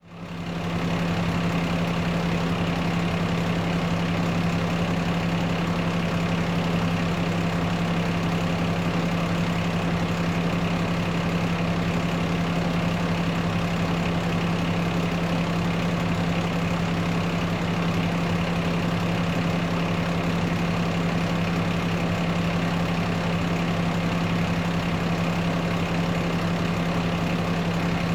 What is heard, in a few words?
Emergency Ambience Fire Truck Idle Close Perspective; emergency effects; AdobeCC